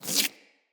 Root Spider Web Shot Proj Whiz; Animals; Spiders; Abilities

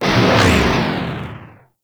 Proj Large Fire; Warframe; Lizzie

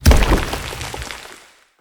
Stone Impact; Powers; Fey; Ward

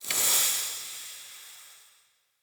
Smithy Steam Burst; Ambience; Soul Plane

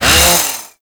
Ghoul Sawman Melee Swing; Warframe; Grineer Ghouls

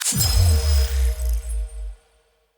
UI Default Theme Login Reward

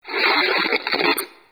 Video Stop; Warframe; NineteenNinetyNine; Stage6TheCatCameBack

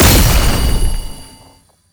Lunge Impact Perfect; Warframe; Oraxia

